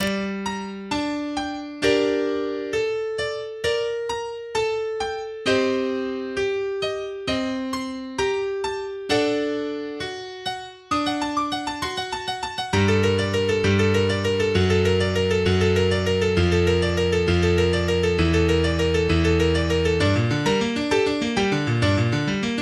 Jingle Bells

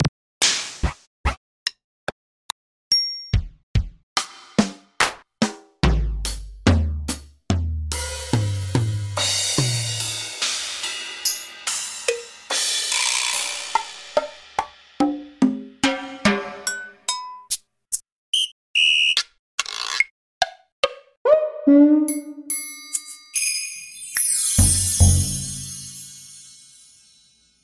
drums

